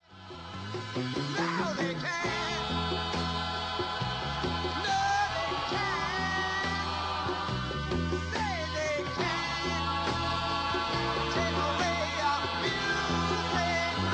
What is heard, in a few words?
music